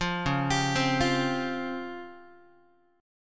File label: Db Major Sharp 11th down Guitar; arpeggiated; JGuitar